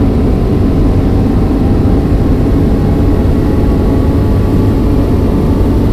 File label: fourth level, car; vehicles; garrysmod; sincar